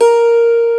irish harp A#3; E-MU Formula 4000 Series Vol. 5; Protozoa; Proteus3 Presets

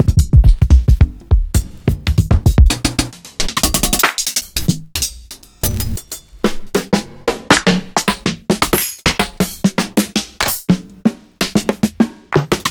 lofi kit

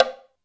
Hollow, Drum Machines Alesis D4

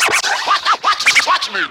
Vocal Scratches 140BPM

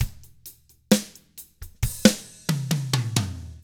SLOW ROCK; 66SLOWROCK; Drums

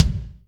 BD, DR BD; Best Service Gigapack 2

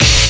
drum stab; E-MU Formula 4000 Series Vol. 2; Dance Hits

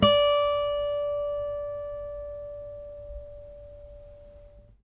ACSMEDPICK, Guitar; 90 s Sample CDs; Quantum Leap - Guitar & Bass

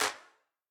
Wider Clap

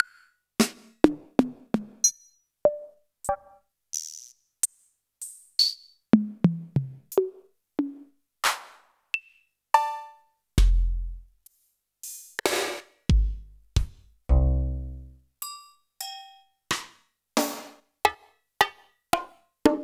Roland R-70

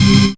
synth, c, Rave Synths; Best Service ProSamples vol.37 - Dance Synths

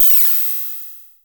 PRO1 NOISE; Crypton - DrumBase5000

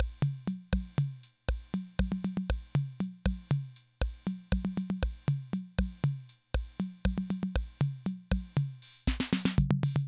Loop, rhythm 55, classic machines

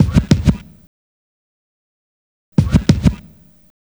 SCR, Hip Hop and R&B; Best Service ProSamples vol.02; AKAI

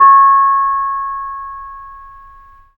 BONANG DB6P; Spectrasonics; Heart of Asia

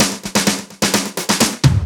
Fills 128BPM, Clubsounds

